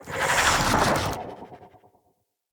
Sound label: Staff Proj By; Weapons; Enemy; Staves; Mnd Wazard Staff